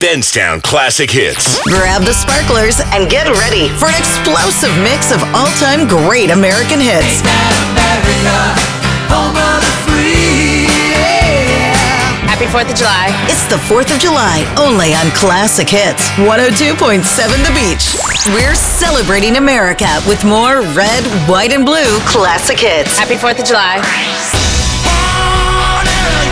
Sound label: Composite Classic Hits